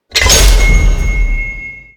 Monster Generic Evolution

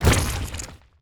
Honey Pillar Final Break

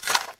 Shovel End

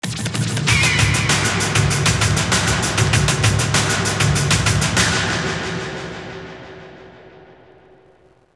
Str G#

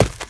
Sfx American football

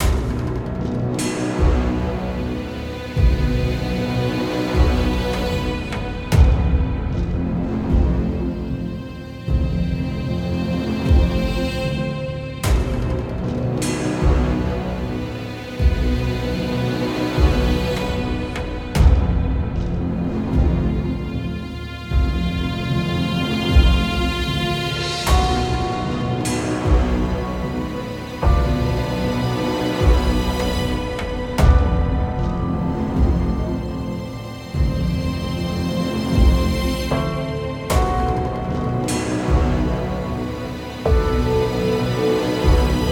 Loop

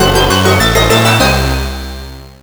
Fanfare Alert; Sigma Star Saga; GBA; Prototype

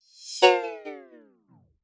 Basketball

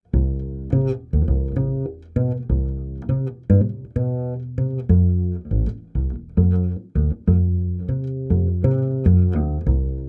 bass play music; gamerip; The Sims 2

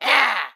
Attack; Pecheneg Gray; Folk Hero

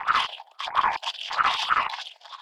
Cat Eat

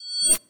Mantis Blade Start

